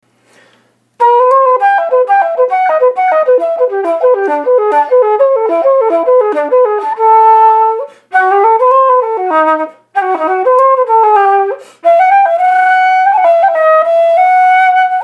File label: style musette